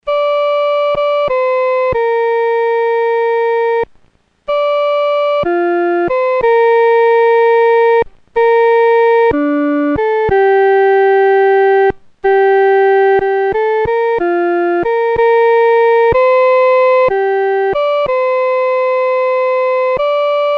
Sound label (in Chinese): 独奏 第一声